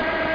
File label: remove hex; gubble12